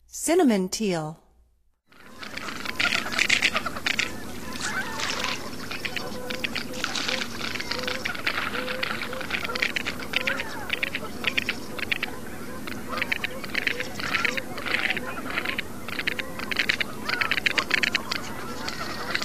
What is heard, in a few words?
Cinnamon Teal, Songs of Birds of North America; Stokes